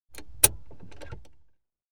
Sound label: int Handbrake released, Cars; BBC Sound Effects Library